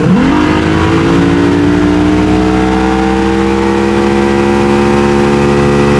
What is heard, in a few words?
zodiac rampup; veh zodiac b rampup